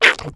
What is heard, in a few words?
squid bounce